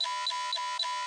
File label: alarm; sona; Alachua County EMWIN System; skywarn